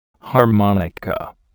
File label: harmonica